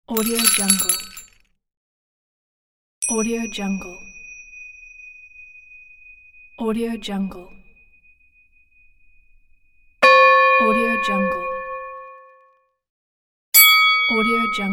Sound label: bell sound